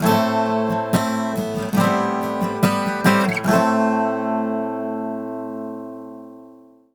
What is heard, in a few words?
GTR, Celtic Flavours; USB Soundscan vol.42; AKAI